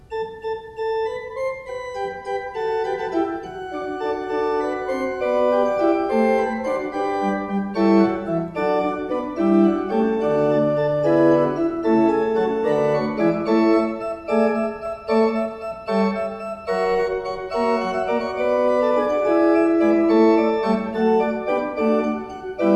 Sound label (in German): Fuehrer, gebrauchtorgel